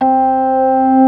ORG. C4; B3 FULL

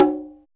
CGA, HIP-HOP; Drums In Your FACE!

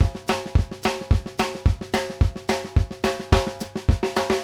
VARI, TRAIN 108; Sampleheads; New York City Drumworks VOL-1; SP TRAIN 108

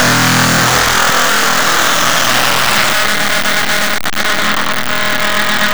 screaming in guitar E, bass

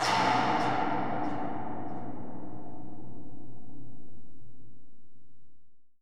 KLANG ECHO; ENVIRN-AMB

